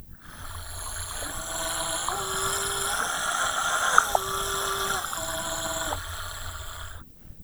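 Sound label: arpeggios breathy a log residual normalized, Female Singer performing a breathy arpeggio, Residual component normalized to -16dB RMS; Power Scaling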